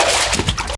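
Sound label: step water left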